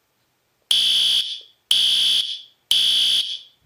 fire alarm

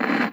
tv static

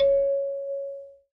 ele arrive; blizzard

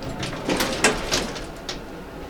elevator open

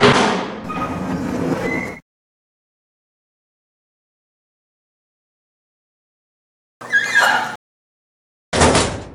bar door seq

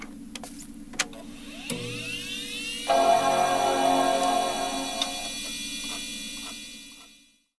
imac boot; blizzard

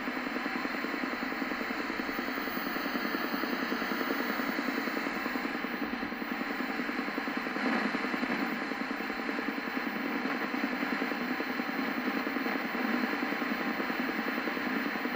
tv static

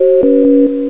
dadeda; blizzard; hlvox

